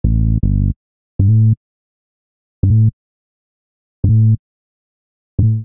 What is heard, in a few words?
Beat That Bass A Minor 85 bpm, Bassline; MIDI Clips; Tonal; Ableton; Factory Packs; Chop and Swing